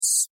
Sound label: Insect Voice Scream, Insect, Voice, Scream, Vocalization, Bug; Sound Effects:Animals:Insects; Blastwave